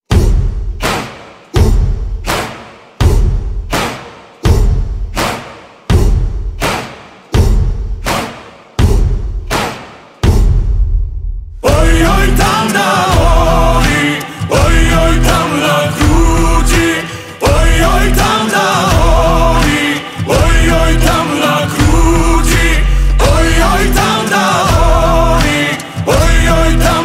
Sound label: acoustic version